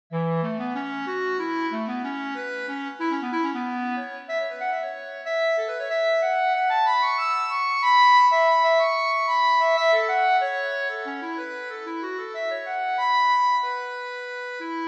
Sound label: shadow clarinet